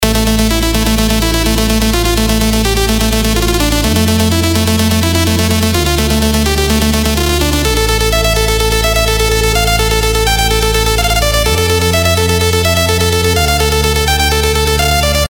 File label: melodyloop